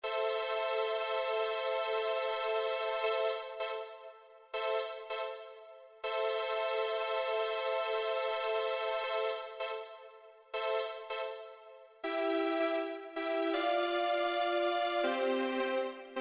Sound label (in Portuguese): Violinos; Klaus; Cor e Variacao; Biblioteca Monteiro